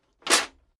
metal plate armor movement single

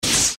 future electric arcing and swoshes

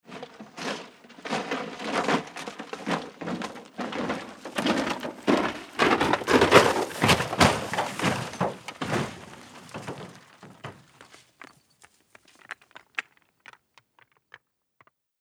car falling off cliff

